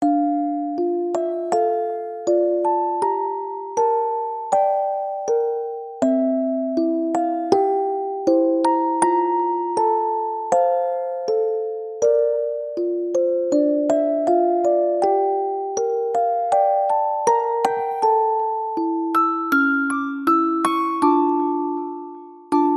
bedtime story

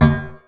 cannonball tap

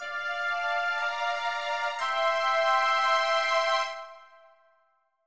win music

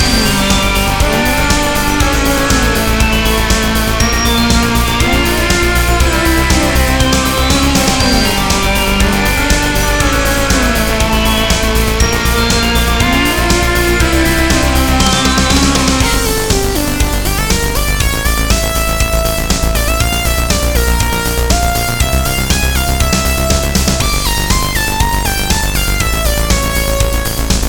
underwater idea loop, zombie soundtrack

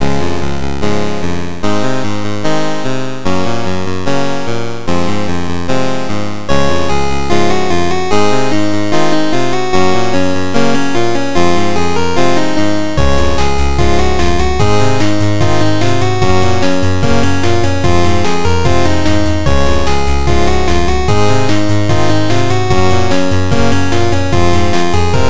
Made entirely using magical8bit plugin

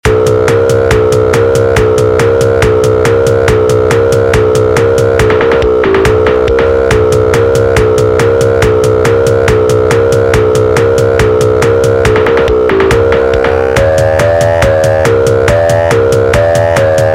horror

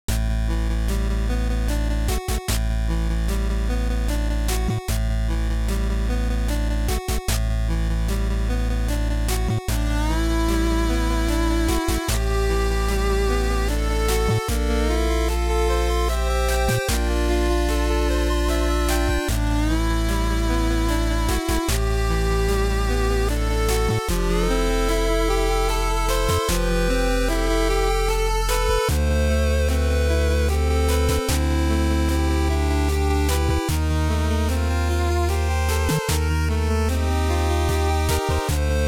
dramatic

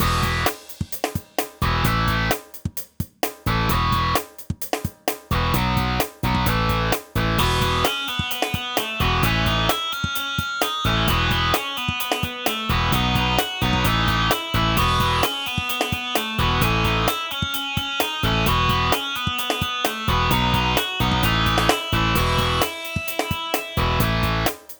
Opening Menu, Punk Sound; This sound created with Fl Studio

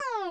laser thing

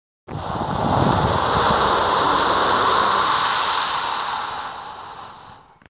space ship, Created as a space ship thruster; Belt Skipper; GMTK jam 2020

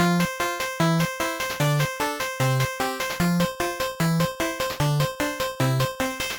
chiptunes

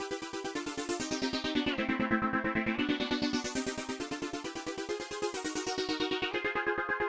Icy Level Up

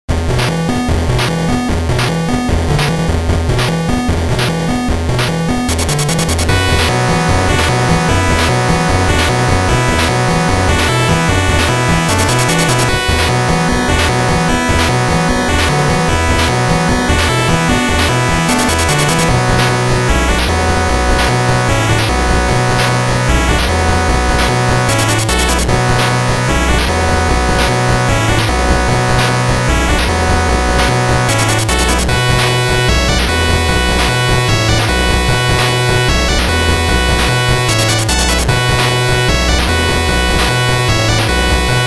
tune

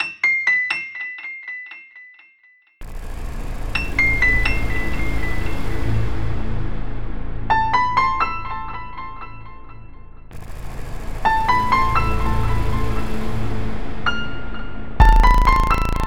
Horror Loop

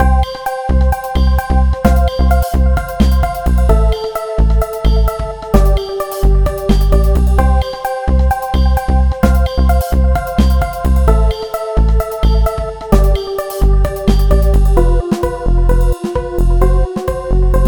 Swinging Sweet, short loops background music

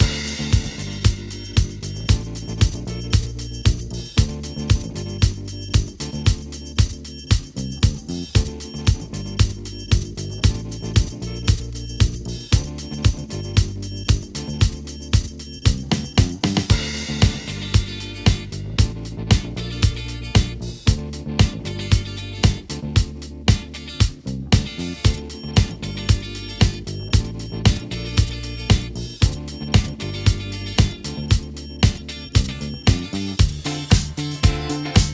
main ambient

